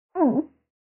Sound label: female drown; Barotrauma; Real Sonar